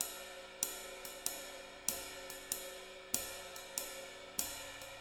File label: swing, drums; Sampleheads; Peter Erskine; Living Drums